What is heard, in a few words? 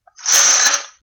Blinds closing